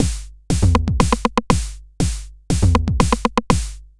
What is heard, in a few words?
Tom